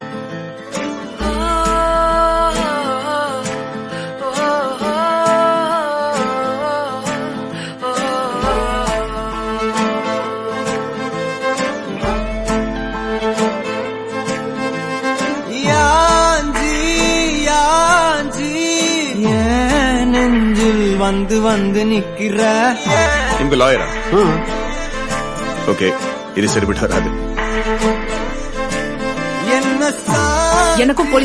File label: Song Ringtone; Vikram Vedha